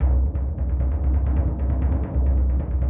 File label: Timpani roll